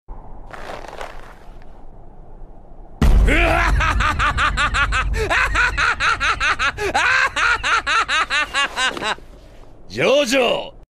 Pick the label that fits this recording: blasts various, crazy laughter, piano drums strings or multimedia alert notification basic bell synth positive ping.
crazy laughter